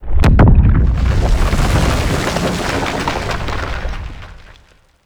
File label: Cave Missile Pounded Impact; Makvoss Cannon